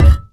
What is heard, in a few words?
physics cube impact hard